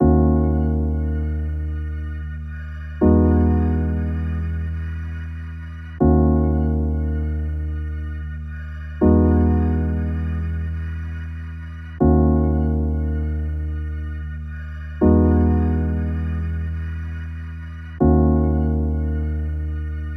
Just Keys Pads; Spooky's Jumpscare Mansion HD Renovation; ps4

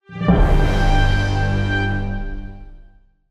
Stingers Objective Positive 148 BPM

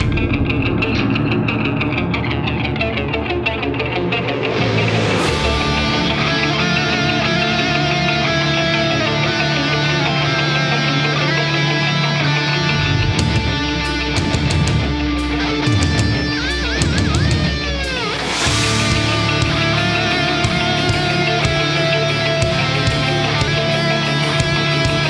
Metal Band; lego star wars the skywalker saga; ps4; xbox series xs; Mustafar

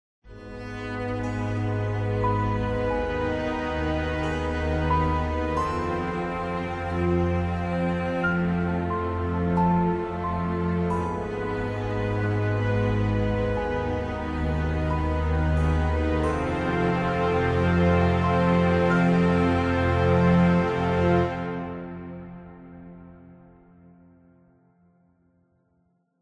Music Success

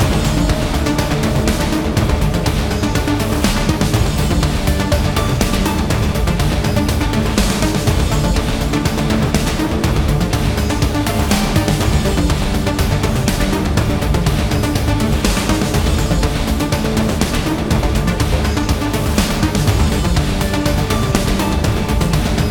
BGM Kowloon Street Boss

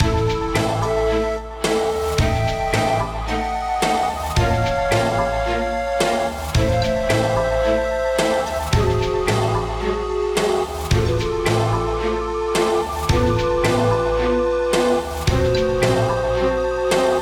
bgm dungeon

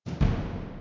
Tymp

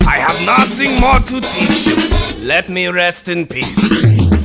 Entering Cool Mode

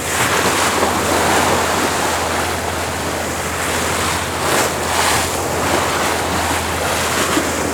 Water Loop

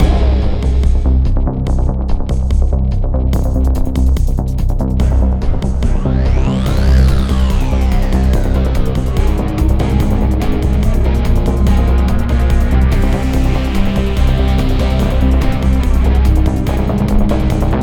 WarmUp Countdown Loop; PWND

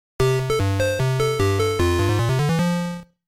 Radar BGM; Game Sound Museum Famicom; Donkey Kong

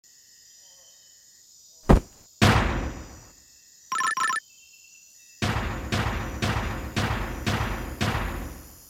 Barrel

